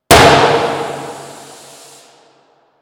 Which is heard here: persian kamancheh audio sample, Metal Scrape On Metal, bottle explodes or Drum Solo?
bottle explodes